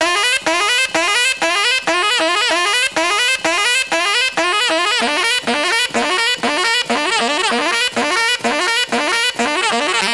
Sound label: Sax, ringtones; Shining ringtones